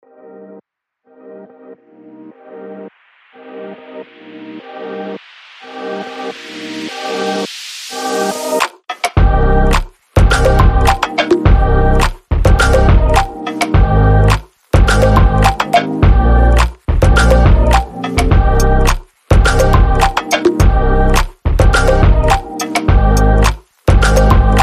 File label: abstract fashion pop